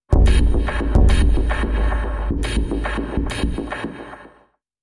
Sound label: Stripped Sting